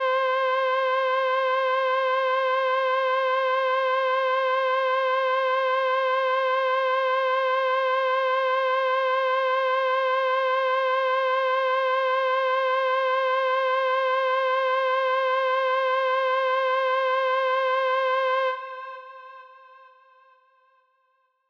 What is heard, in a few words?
Lead